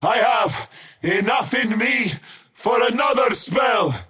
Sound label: skywrath crown cast pain; skywrath mage